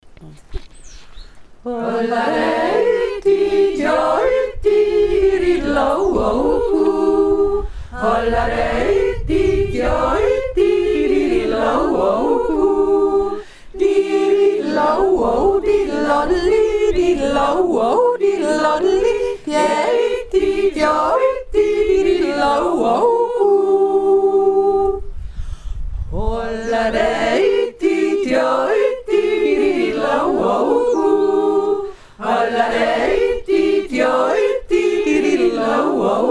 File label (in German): hermannsbravourjodler